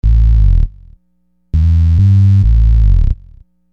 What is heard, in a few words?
Hard Synth Bass; Construction Kit; HIP-HOP; Sonic Foundry (Sony Creative Software)